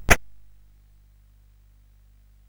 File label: sid CSG8580R5; hvsc